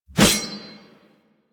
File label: Sword Swipe, Melee Whooshes; Warframe; Weapons